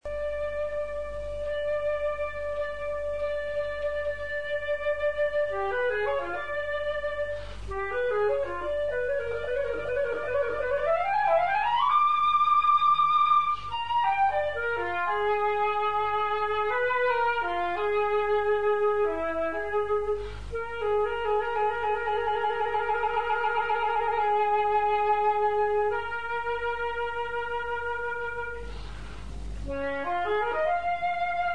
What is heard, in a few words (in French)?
flute seule; Ibert